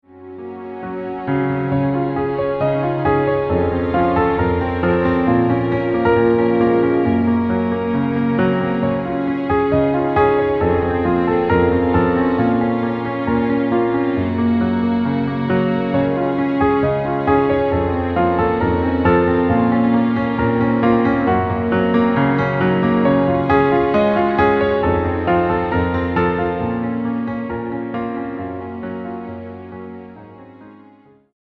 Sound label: piano strings; instrumentals; Chill out